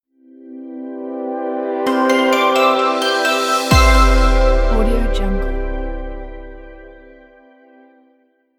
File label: the logo intro